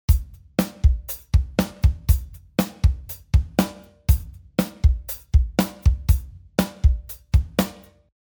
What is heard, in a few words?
Bass